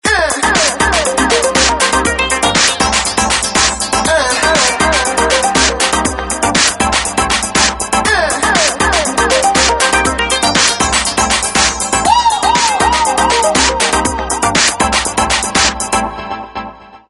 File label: Dive, Samsung SGH-E250i